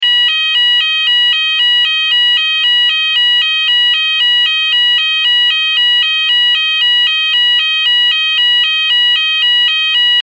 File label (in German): Ton